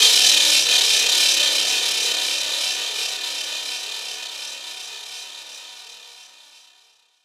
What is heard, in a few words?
FX Downlifter